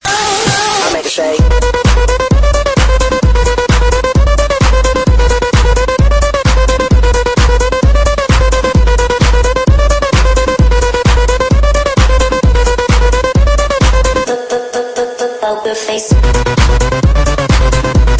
electro club house mix ringtone

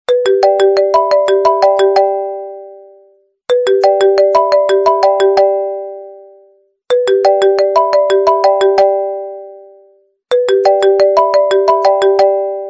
iphone craze ringtone

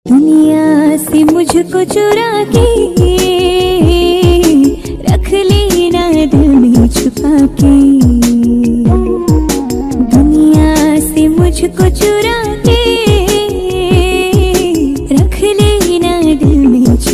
hindi latest bollywood ringtone

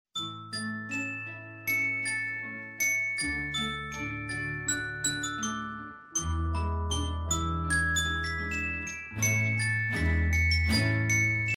message tone ringtone

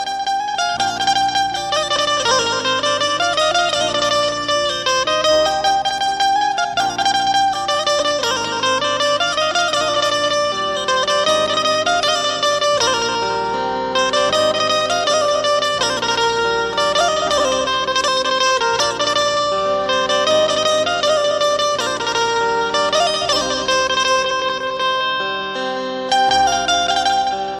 instrumental banjo ringtone; bollywood

